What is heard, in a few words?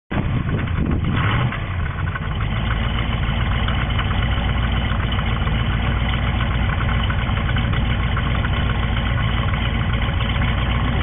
70 chevelle ls6, exhaust